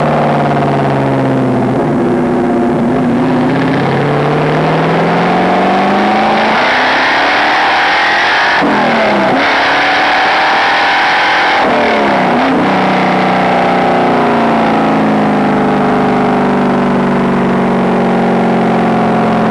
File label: BWAAHH; Major